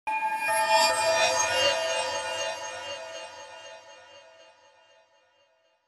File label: horror